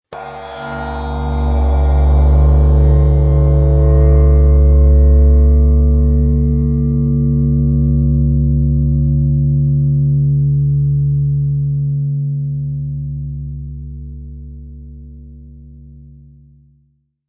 Cartoon Drum Cymbal Weird; Comedy/Cartoon; Vibrating impact, double vision; Funny Sounds; Lynne Music

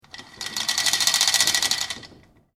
Chain Through Pulley Medium Speed; Chain Sounds; Metal Sound Effects

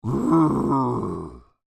Creature, Human, Moan, Throaty; Groaning

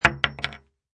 Bullet Shell Drop Pistol Hollow Wood; Bullet shell drop, Pistol: empty Pistol shell falls on hollow wood.; Impact

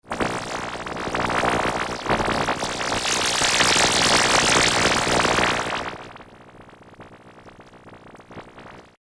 Alien Machine Scanner Analyzer Warble Shimmer; Computers; BBFX

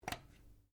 RIFLE REMMINGTON700 7.62MM SCOPE COVER REAR OPEN; A selection of domesttic firearms; Rifles

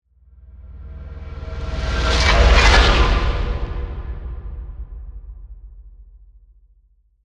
Whoosh Mysterious, Reverse, Accent, Transition; Production Element, Imaging Element; BlastWave

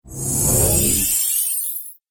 Future World Whoosh; Whoosh Sound Effects

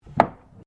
ORGAN PUMP STOPPER IN, Old portable church organ; Keyboard Sound Effects; Keyboards (Sound Effects:Musical)

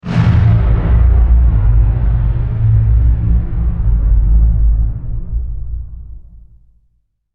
Science Fiction Explosion Flange; Battle

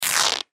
Cloth Rip Tear Pants Fabric; Ripping; Foley; Ripping Sound Effects; Objects

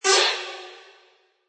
Hit Warble Fast Reverb; Scifi Production Elements, Set 1

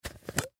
Thermos Lid Open, Thermos Lid Open Pop; Pops; Sound Effects:Objects

